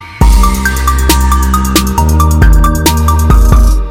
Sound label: beats